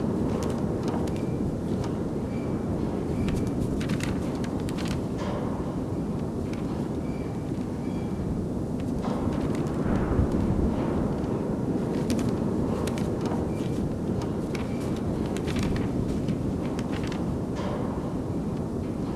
Outside wind; zps; Ambient

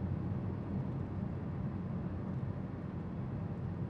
City ambience; zps; Ambient

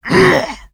attack, Zombies; zps; Z lea

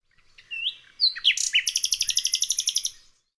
nightingale; Ambient; Birds; zps

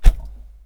fridge door close; zps

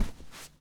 padded footsteps; zps; Foley

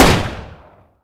mp5 fire; zps; Weapons; Firearms

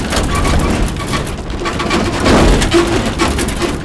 metal rattle loop; zps; Ambient; materials

